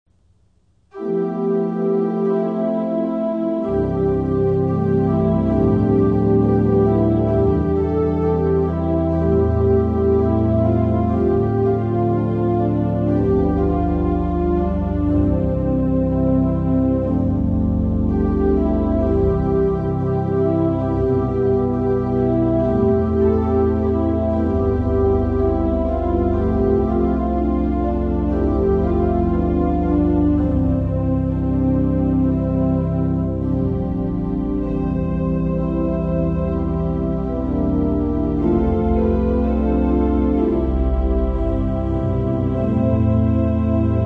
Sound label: Army Hymn (The Cassions Go Rolling Along)